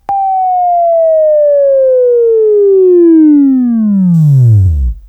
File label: Buzz; Labview-Program; Sweeping Training program